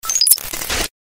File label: Sparkly futuristic glitch